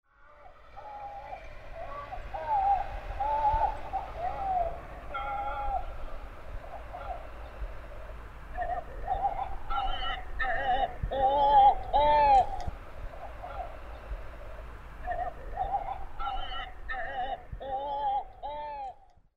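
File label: kawau, Great Cormorant; hyogo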